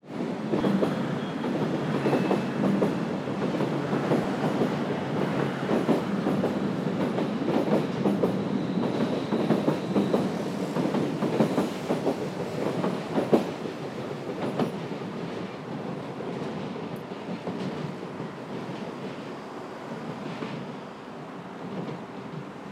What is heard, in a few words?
Train, city, Paris; lilesound